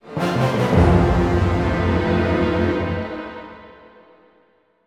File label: Game Over; Beat Saber Clear Fanfare